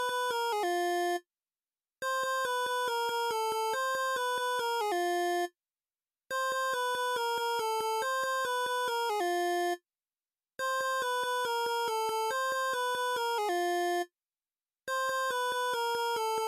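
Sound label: instrumental; iron man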